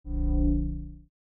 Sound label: space shield sounds